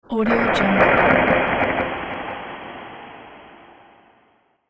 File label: atmospheric crush